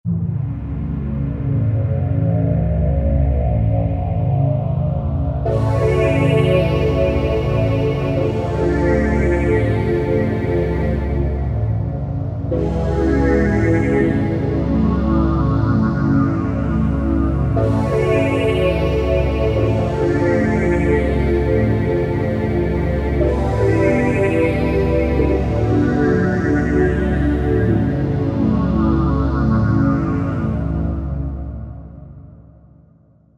sub wave; Universal Sound Bank; Soundscan 14 Twisted Special FX

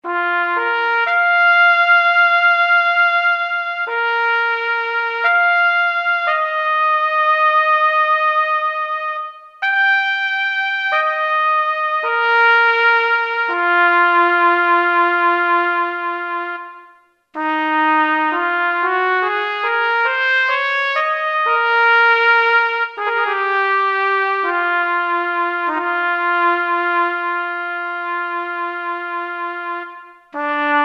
Copland Sft Trp

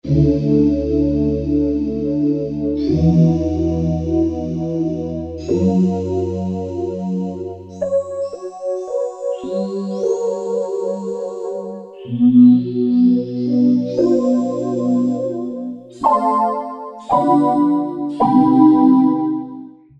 Voices, Roland MKS-70

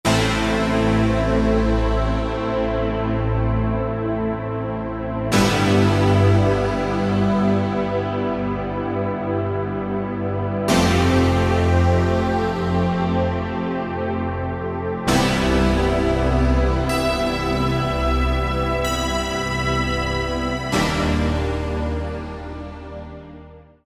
Pluck Mix; Optical Media International Sonic Images Library

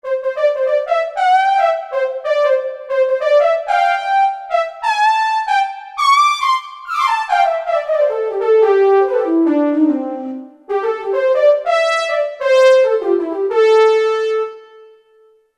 Brassy Fluty Lead, Kurzweil K2000; Kurzweil K2000 Contemporary ROM; Contemporary ROM Programs and Demos diskette; SYNTHTEX

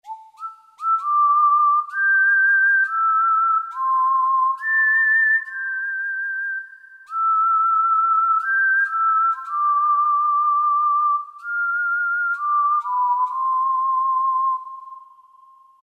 Whistle

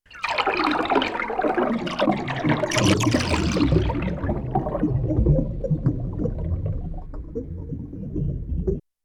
pouring down stereo, Yamaha TX16W; Sound FX

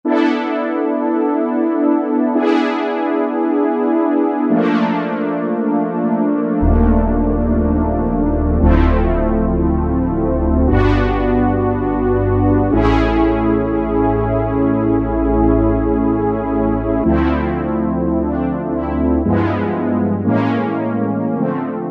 An Brass, Generalmusic S2R Performance

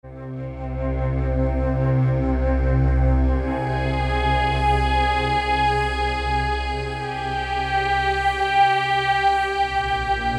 Cello Dream; Generalmusic Equinox

